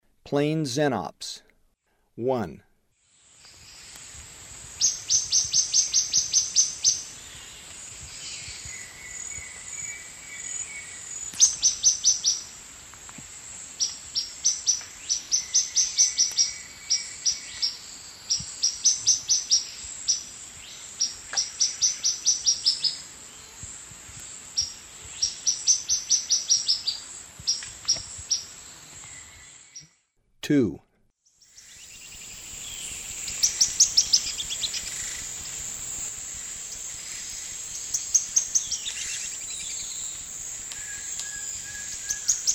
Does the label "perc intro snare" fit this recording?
No